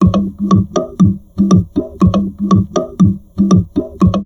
TALK, Drum Loops Crazy Processed

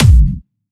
Distortion Kicks, Bassdrums

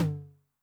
MOD Noise, 909mod MID TOM

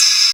synth, Dance Synths Trancers

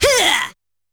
B4 Huuaah!, Yamaha PSR-E323 Sound Effect Kit

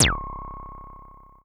Perc Anime Laser, Vermona Mono15 Drums

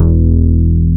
BAS MKS-50; Best Service Gigapack 2

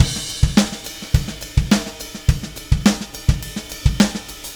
Beat Crash; Session 02 - Clinical

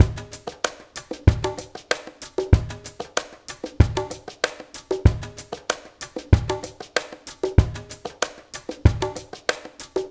ABO MIX, Liquid Grooves; Spectrasonics

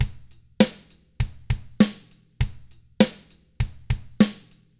ROCK, Drums

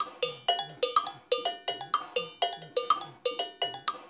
percussion loop

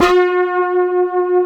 CHIFFER LEAD; Greytsounds; Scott Peers Sound Engineering

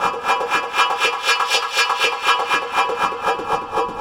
Echo Chuff, Synthetic Pulses

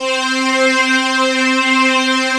Prophet Five

